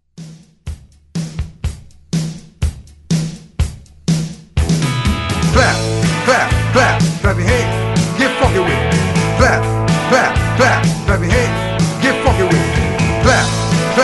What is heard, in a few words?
Rock Hype Re Drum 125Bpm